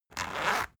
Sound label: zipper open; objekte; reissverschluss